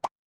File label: cartoon pop